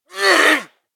spotted, zombie; DayZ Epoch

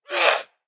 attack, zombie; DayZ Epoch

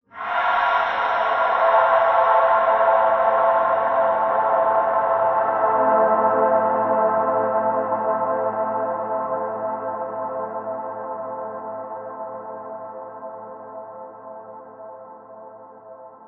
suspense; DayZ Epoch

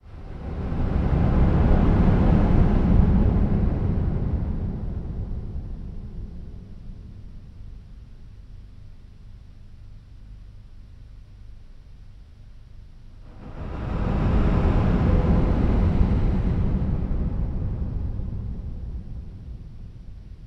suspense; DayZ Epoch

